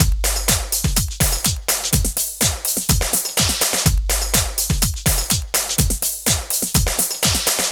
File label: Drum Loops